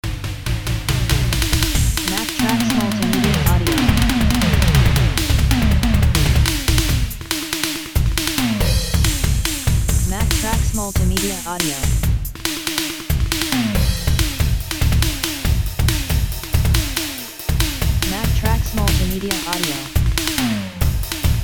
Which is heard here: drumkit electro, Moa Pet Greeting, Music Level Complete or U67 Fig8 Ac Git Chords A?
drumkit electro